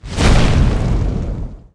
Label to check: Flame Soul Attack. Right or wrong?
Right